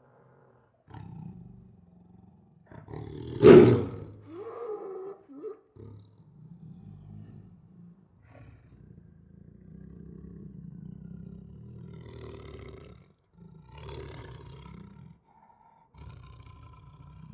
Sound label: Siberian Tiger Aggressively Growls, Roars and Snarls; Tigers; Sound Ideas